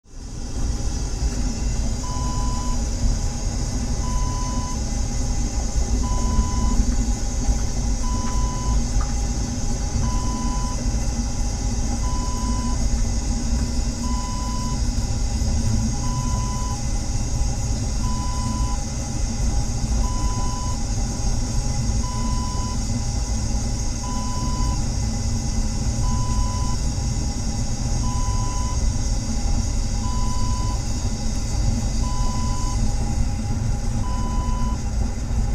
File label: train toilet ambience